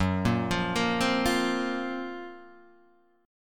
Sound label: Gb Major 7th Flat 5th, down, Guitar, Half Step Down